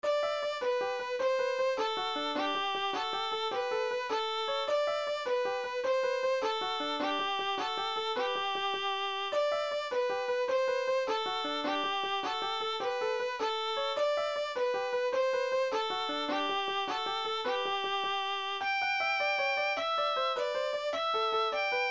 melody harmony; Trip to the Cottage 2

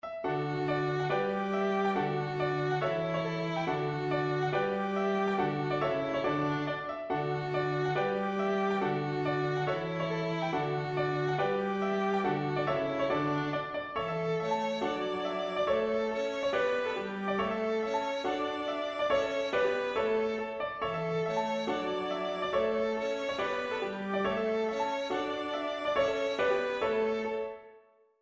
strathspey; Keel Row

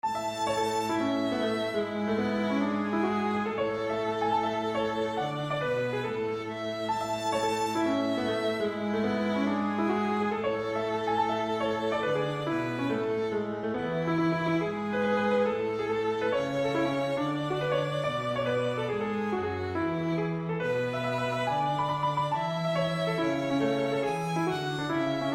4 PARTS; Alices Stairwell

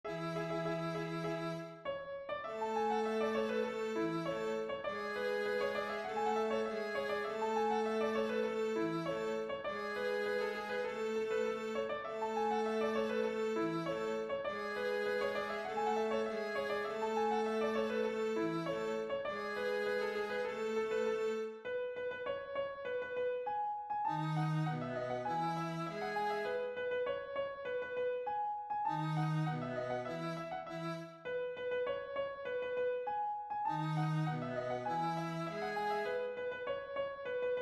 Polka MEL VLA VC BASS; Countess of Dufferin

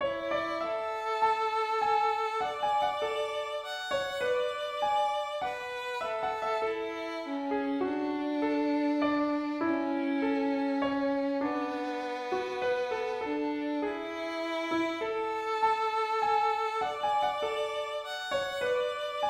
waltz

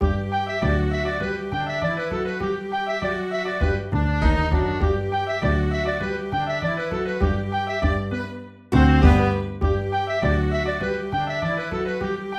contra dance; Pig Town Fling

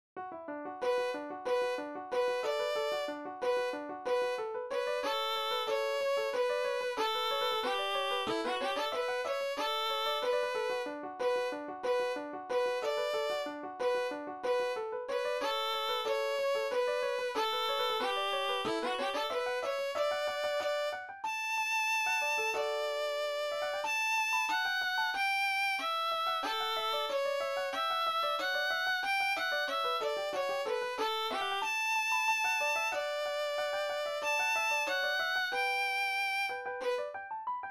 Melody Harmony; Ragtime Annie

